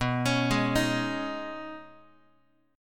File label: B 6th Add 9th B down Guitar 4A 4E 5A 5D 5G sharp 6C sharp; arpeggiated